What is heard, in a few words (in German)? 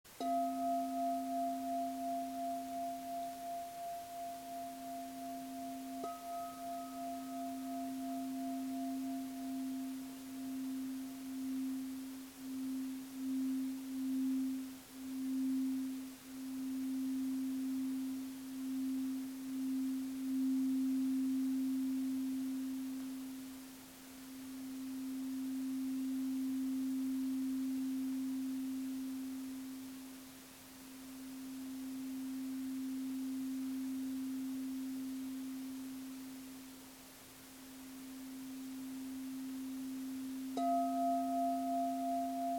Hörprobe Presence 24cm Ton C